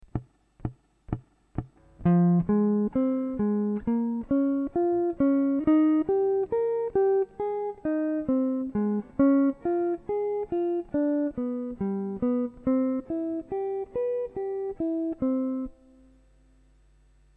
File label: all the things triads